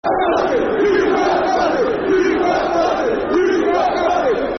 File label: Football Chants (Soccer Songs), Liverpool; You Fat Bastard; Liverpool Lfc